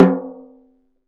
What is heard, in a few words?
Snare Drum; Percussion Samples